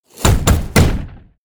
golem angry; LoL; monsters

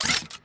Ball Flash Reverse; orianna

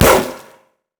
tiger attack roar; LoL; udyr

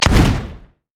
pirate basic attack; LoL; gangplank

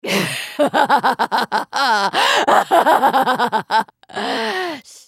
laugh; Cassiopeia; Character Voices - English

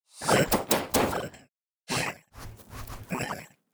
lizard elder bored; LoL; monsters

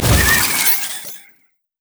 upgrade; LoL; heimerdinger

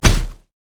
pirate basic attack; LoL; gangplank

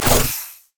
decimate; LoL; darius zaunknight

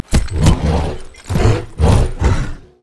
emote laugh; LoL; sejuani

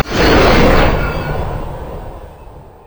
dash strike; LoL; yasuo base

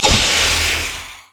smoke grenade; LoL; graves

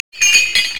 brazier chains

